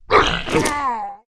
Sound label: Mob die; Monster; 묘족; 하문왕자; 2단계